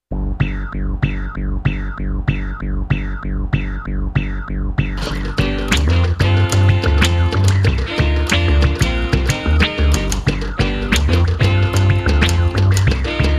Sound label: The Typewriter Jam